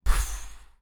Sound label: Poof